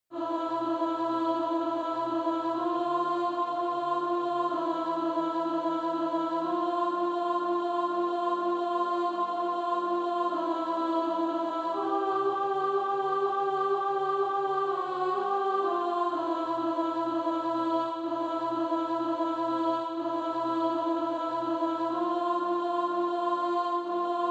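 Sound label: alto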